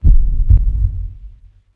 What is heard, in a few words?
drugtrip beat; Max Payne